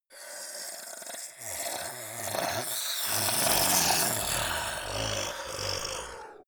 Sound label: zombie grunt choke male wet; kreaturen